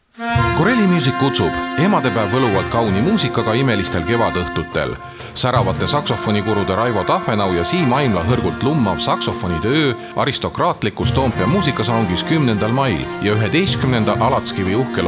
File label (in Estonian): saksofonid; corelli